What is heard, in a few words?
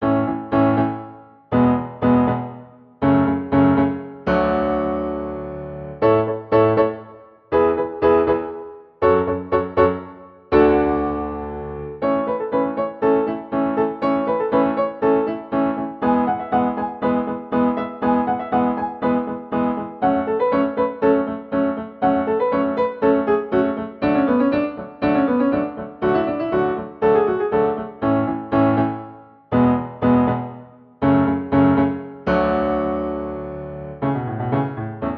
I V vi IV Piano A